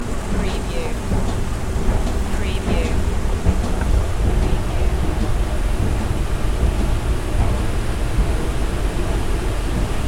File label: Escalator